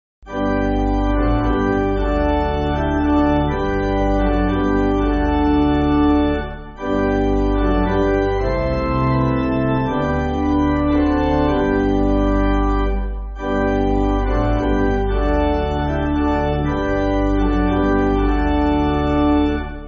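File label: Pipe Organ, Music Backing Files for Churches; My Beloved Has Died; Voice Of Praise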